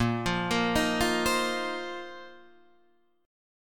A sharp Suspended 2nd Suspended 4th down Guitar DADGAD